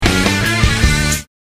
whir whir whir whir whir

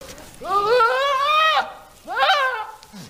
adult goat wail

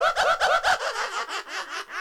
laugh x4; lolbit

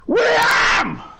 screams

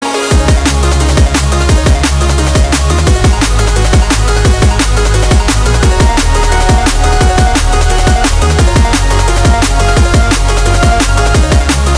song

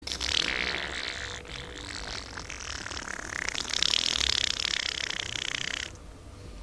fart

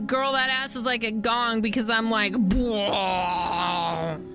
gong

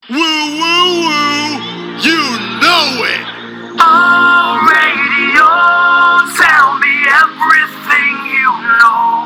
woo woo woo

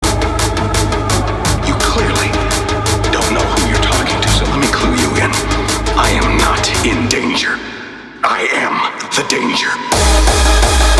knock knock

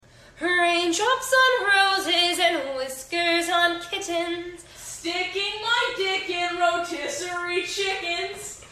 chickens

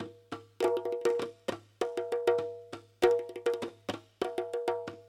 drum

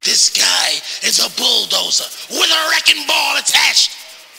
bulldozer wrecking ball

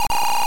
skid; sonic 1

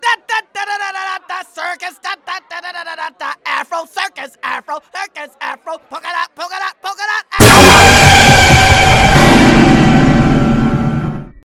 clown jumpscare

